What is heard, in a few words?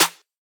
Snare, D#; Drums; One Shots; Snares; House